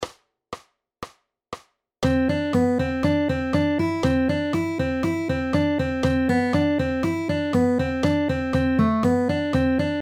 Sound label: Melodic Rhythm Rhythmus Beispiel